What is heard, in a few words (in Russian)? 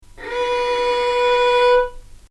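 скрипка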